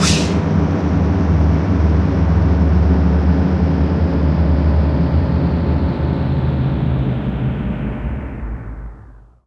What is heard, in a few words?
POW; XXL 800 Production Effects